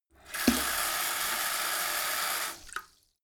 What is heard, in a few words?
sink fill splatter hard short